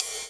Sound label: Ride; Equipped Music; Loungin House